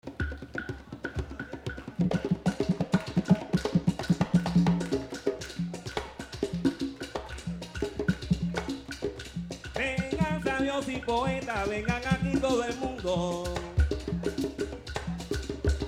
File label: Rumba guancaro